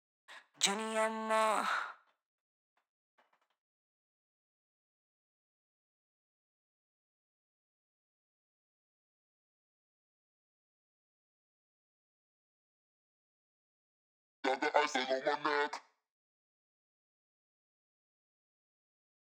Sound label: Vox Ad Lib; Mysterious